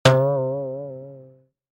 Comedy Wap, Stingers; iLife Sound Effects

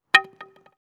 Baseball Bat; iMovie Sound Effects

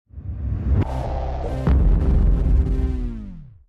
Booming Reverse; Booms; iLife Sound Effects

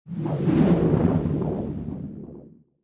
Electric Flutters, Stingers; iLife Sound Effects

